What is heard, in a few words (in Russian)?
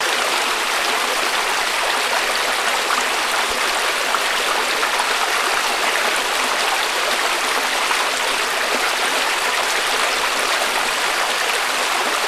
vodopad